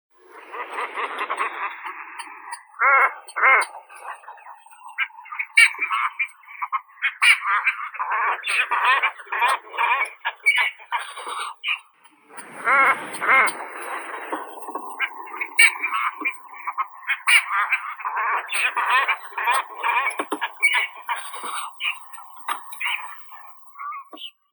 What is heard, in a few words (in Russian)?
baklan malii phalacrocorax pygmaeus